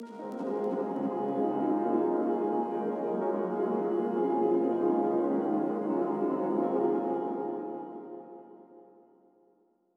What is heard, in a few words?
CMaj sweet piano pad